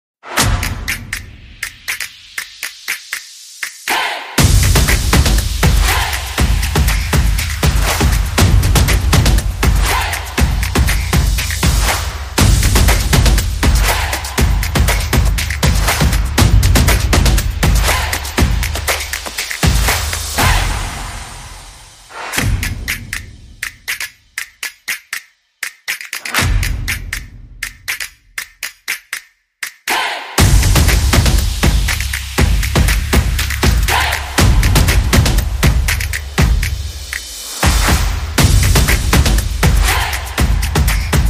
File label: Rhythmic